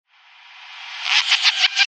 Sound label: evil laughter reverse real